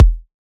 kick E, hip-hop drum kit; 99Sounds x Monosounds; Moon

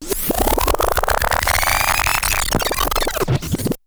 TAPE WIND; E-MU Formula 4000 Series Vol. 2; Effectors

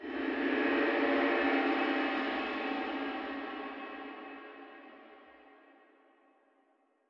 slow dark cymbal

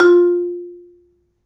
Marimba, F2; Terkelsen

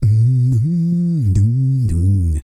CROON, World Voices, BRAZILIAN; Spectrasonics Vocal Planet CD5

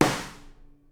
SNARE, Live Snares

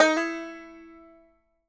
BANJO NOTES, E 4; Sampleheads; Whole Lotta Country 1 & 2

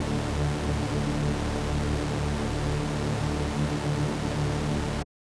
SuperSaw D3; KG MegaSaur; M8

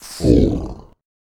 VOICE FX, Dance Vocals

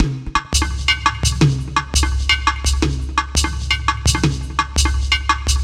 Mixed Percussion, percussion loops

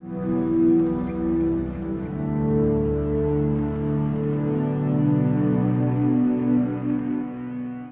Shiva, Acoustic Drone, One-Shot Beds; Sonic Foundry (Sony Creative Software) - Friendly Fire; DroneLoop

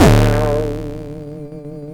Electron Sidstation, Drum Machines; 300 Drum Machines

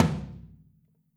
FLTM OH L, Kit OH Mic, Drums Percussion

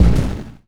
FX Hit; Drums & Drones

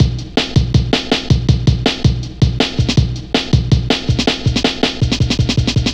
Drum Loops, Jungle

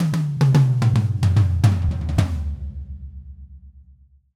Red Maple Groove 110, DW Red Maple Kit

